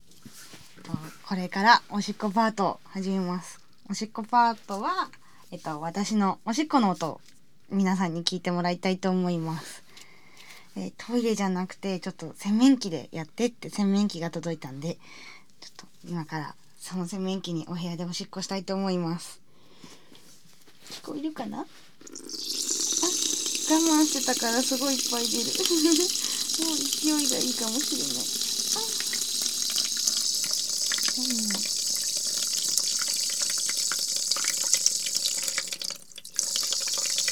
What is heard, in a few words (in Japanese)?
おしっこ生録音